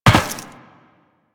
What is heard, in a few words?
Land Metal Perforated, Footsteps; Warframe; Tenno